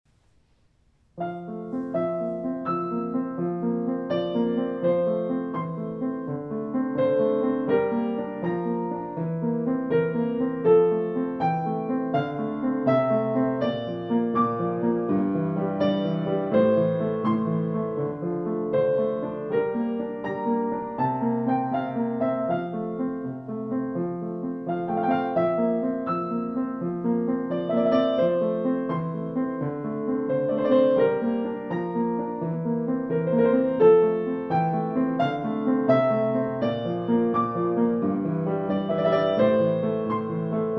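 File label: Sonatina B flat Major II Andante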